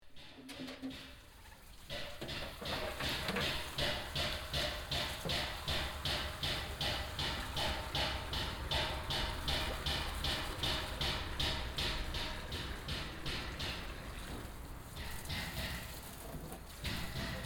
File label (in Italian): Rame battuto